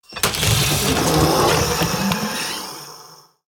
Gryphon Death

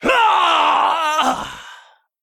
Female Worgen Death

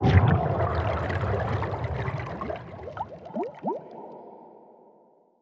Underwater Death

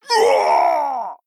Orc Male Death

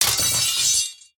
Glaive Large Underlay Death

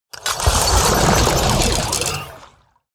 Mechanical Fish Death

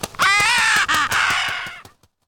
Albatross Play

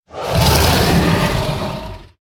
Dragonmaw Sky Stalker Play

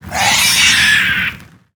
Fiery Bat Death

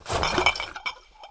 Glass Bottles Underlay Attack

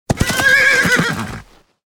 Armored Warhorse Death Underlay

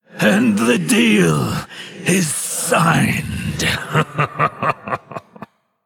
Male Undead Death

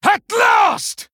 Male Night Elf Attack; Kurtrus; Cariel

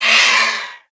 Black Whelp Death

